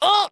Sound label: Rat Hit